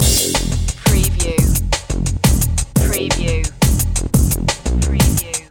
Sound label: stimulation loop